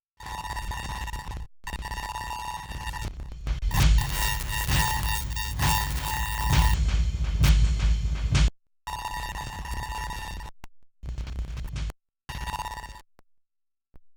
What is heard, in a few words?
some digital distortion